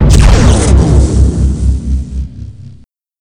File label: emp missile hit; weapon